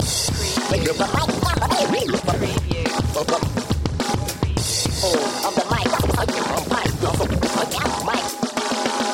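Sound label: Scratch Groove 105 BT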